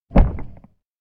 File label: elec rock protrude; anemoi